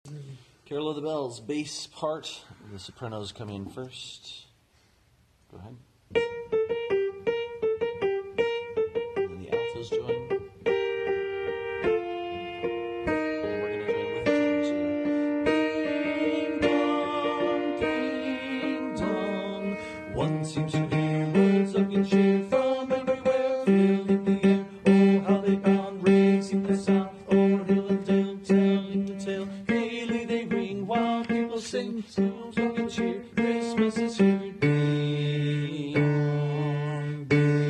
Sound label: BASS